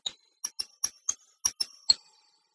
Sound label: Glockenspiel